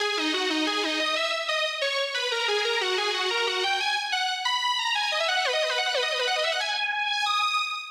Intro Lead; 試しに全部上げてみました。

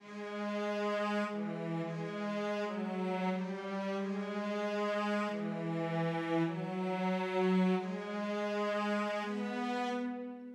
Chord Pad Cello; チェロ; BBC Symphony Orchestra

